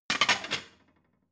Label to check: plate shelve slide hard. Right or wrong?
Right